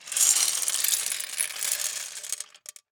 Gumball machine, metal, glass, candy, filp, jostle, clatter, hyper; Eiravaein Works; Vaeyan IV